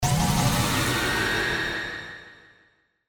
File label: Burst Reverb